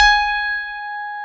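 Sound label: piano electric G#2, CP-70; Sound & Vision; Gigapack I CD 2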